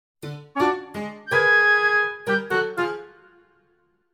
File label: Eye Catch Comical